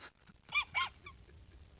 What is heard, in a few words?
whistle